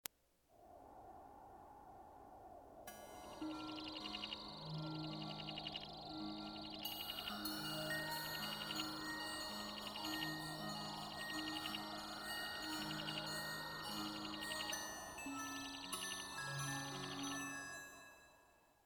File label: SY99 environment